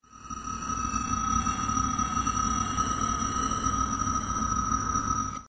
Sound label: alien scene, Atmospherics